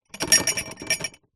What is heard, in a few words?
household plastic tray tub containing glasses plastic cups short shake